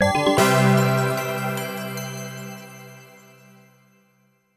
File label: Jingle Right!; Final Answer